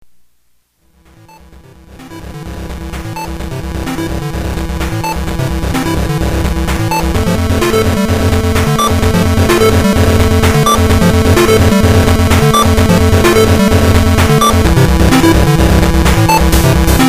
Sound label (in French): Voici Une Transition Melodieuse